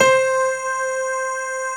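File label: grand piano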